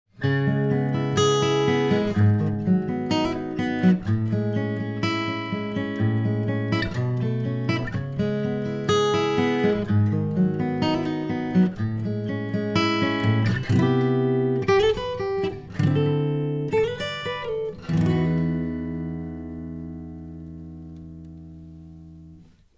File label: Mic Picking